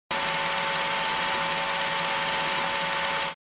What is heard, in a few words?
Minigun spin loop